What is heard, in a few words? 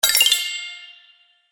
get coins; Cartoon Network - Toon Cup 2020